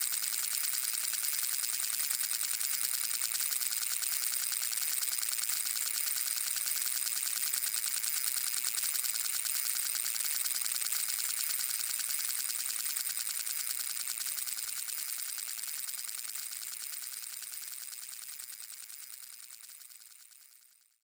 Tether Reel In; DriftMine

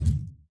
Hand Paddle Attack Light

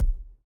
Ball Player Hand Hit Tackleball Extra Light

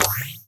Tool Trigger Pull; TechTree

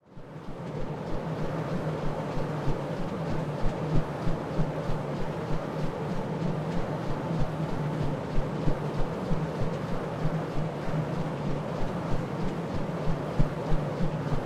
Air Danger Close Loop; Scraprun; Deathrun

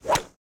Ball Player Hand Hit Golfball Hard

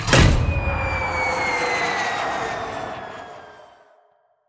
Bomb Release And Fall; Scraprun; Deathrun

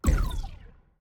ball collision geo ricco

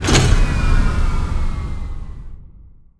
Spinning Arm Slowdown; Scraprun; Deathrun

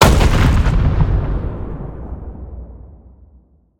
Bomb Explode; Scraprun; Deathrun

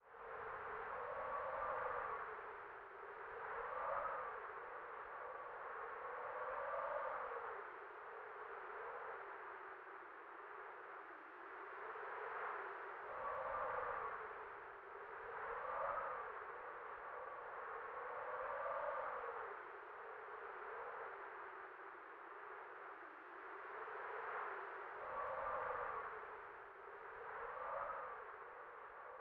Ball Movement Loop Air

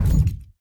Hand Paddle Attack Hard

